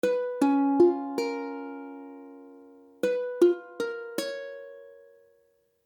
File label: Cis, ukulele; ukulele akordy